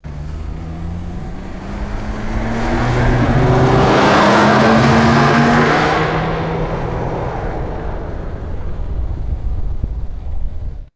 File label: fast luxury sedan passing by closely; vehicles